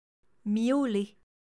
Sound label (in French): grille pain miauler